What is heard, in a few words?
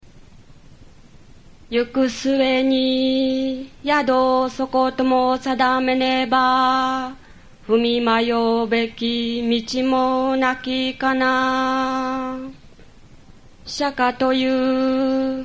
only chant before discourse